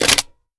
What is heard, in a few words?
reload mag in; gmod